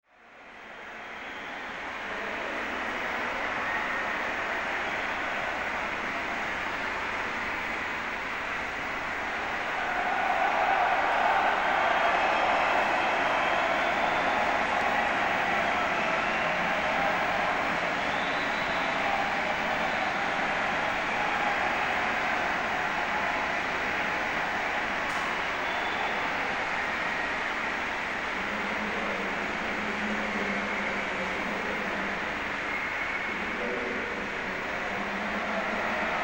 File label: mambo